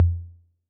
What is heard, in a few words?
Tom Low A 808, Clean Digital